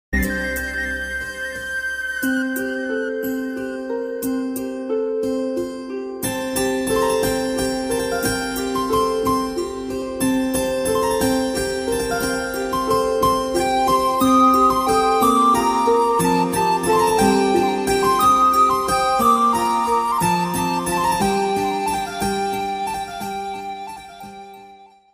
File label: appa song bgm